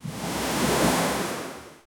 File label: ocean